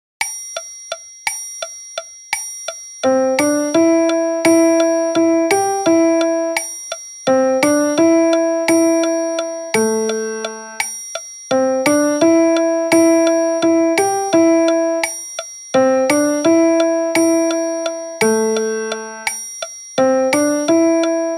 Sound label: Piano Version; Gole Gandom